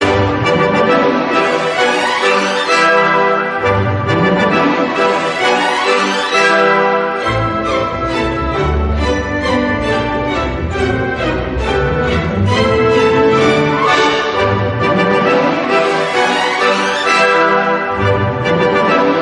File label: classical marsh ringtone